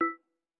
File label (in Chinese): 通用点击置灰; nba